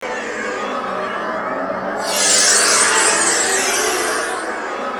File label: HORROR; East West; Global Sounds